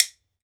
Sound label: WOOD BONE, Celtic Percussion; Drums&Percussion; E-MU Producer Series Vol. 7 – Old World Instruments